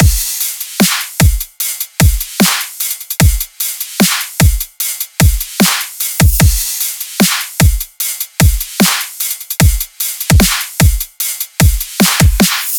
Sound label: Full Drum Loop 150 BPM, Dubstep; Detonate; Cymatics; Dubstep Starter Pack